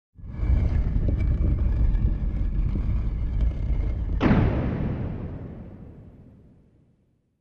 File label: Warehouse Door close